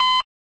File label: beep test; Portal 2; Source Engine; video games